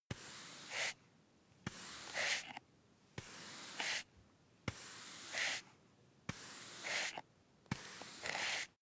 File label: broom hand sweep dustpan slow sequence